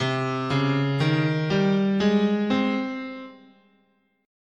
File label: C Balinese Pelog, scale